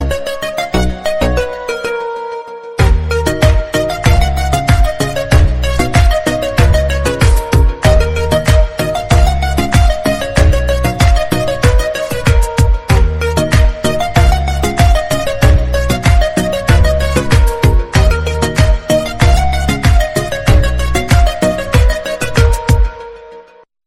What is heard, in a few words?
background music tone ringtone; galaxy